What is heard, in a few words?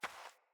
Beaver Dig; Foley